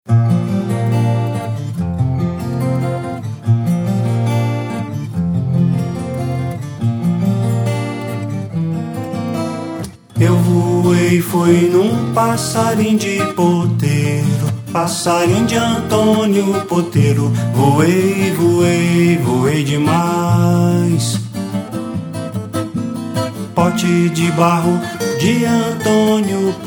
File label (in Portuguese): voz violão